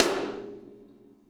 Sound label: Snare Shard; ZeroGravity Drum Shards